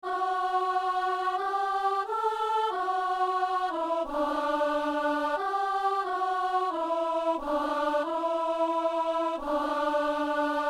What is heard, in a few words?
soprano